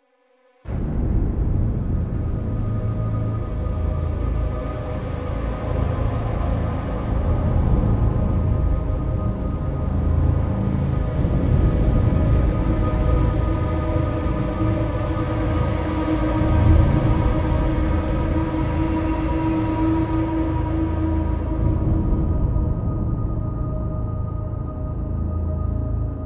defeat fallen; Armies of Exigo

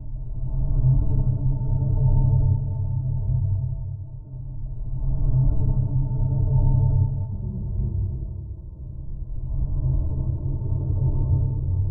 Amb Pulse; SFX Ambient

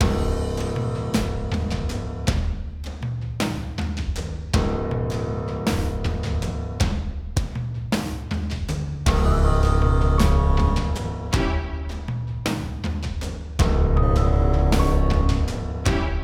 Cemetery Loop

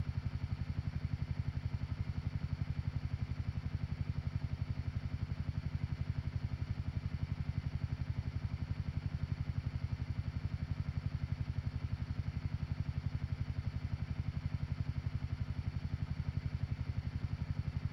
light buzz; teardown ps5 windows xbox series xs gamerip 2022

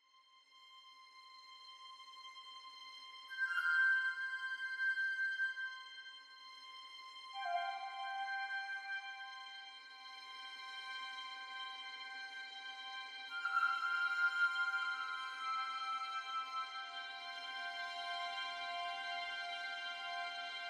Background Story Intro

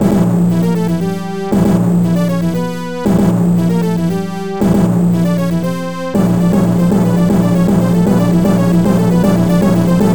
Area 1 Clear; nis america snk 40th anniversary collection

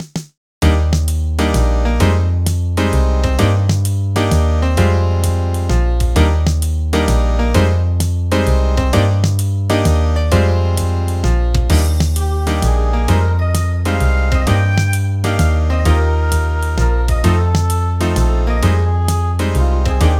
Jazz

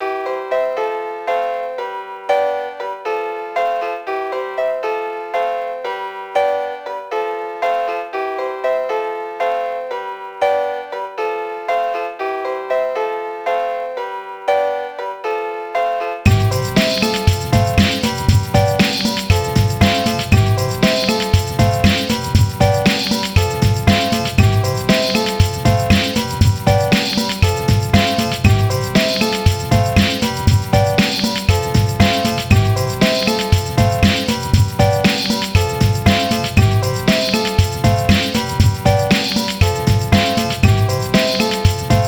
Cuban; Cuban Layer1

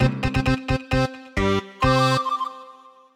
end stinger; SpongeBob Patty Pursuit; dutchmansship

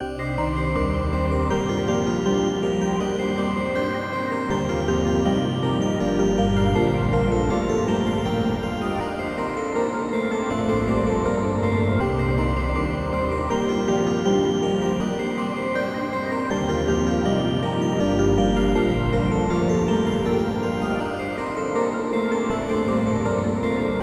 Deep Drive (Ambient)

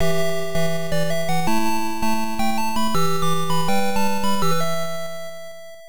Fanfare; Gilgamesh Sorcerian; Sharp X1 turbo

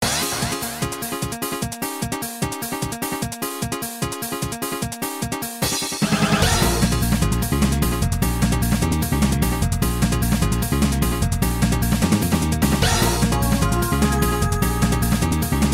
stinger

